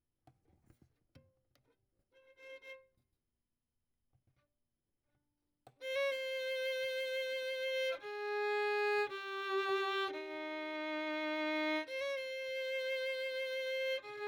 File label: Violin; Verdigris Music